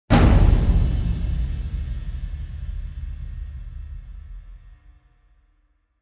Africa Hit; Gothic Storm Music

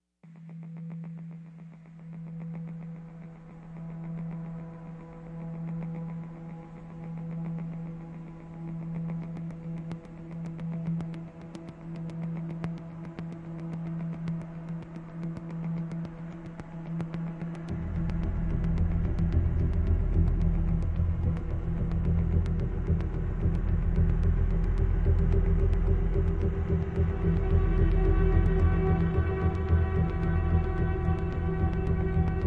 No Perc; Ninja Tracks

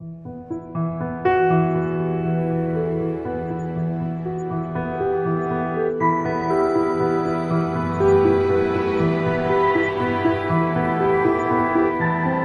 Ruminating Ambient

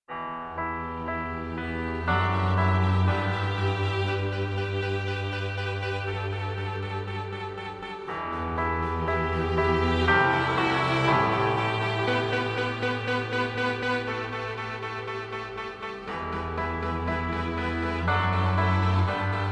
Desire Ambient

